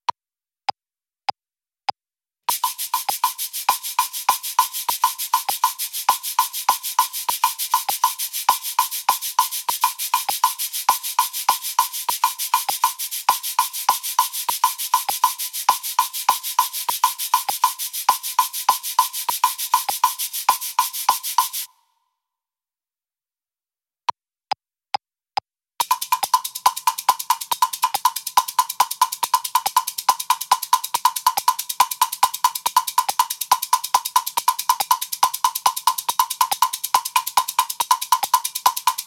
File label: Cabasa Foot pedal; shakers